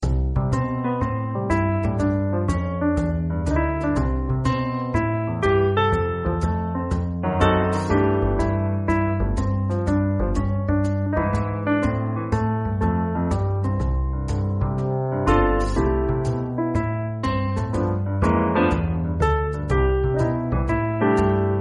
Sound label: theme loop